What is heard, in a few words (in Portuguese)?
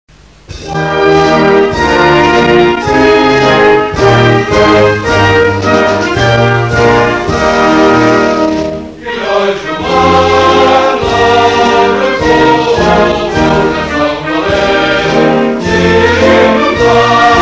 Hino Nacional Portugal (Cantado)